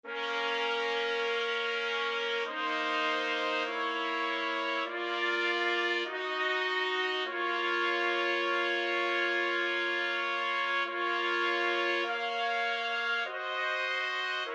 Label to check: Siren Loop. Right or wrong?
Wrong